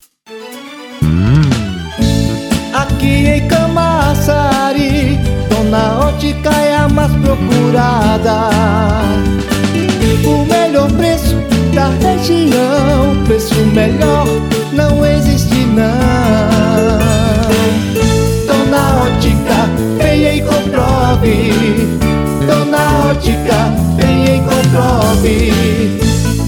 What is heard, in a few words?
Jingles; dona otica